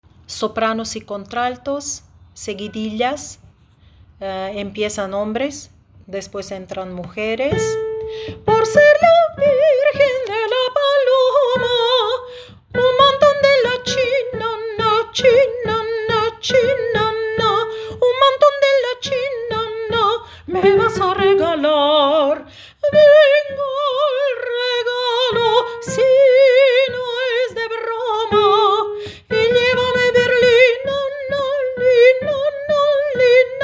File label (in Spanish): sopranos contralatos